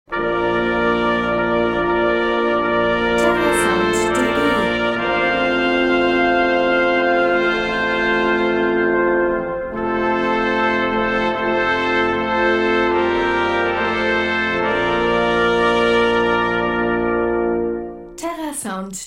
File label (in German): suesser die glocken; terrasound